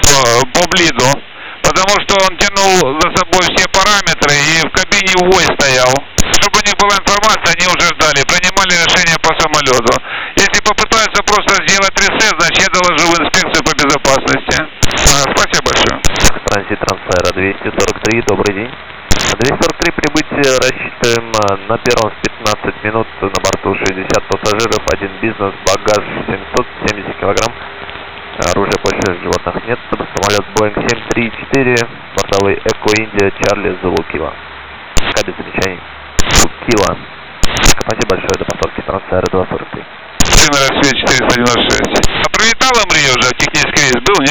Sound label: engine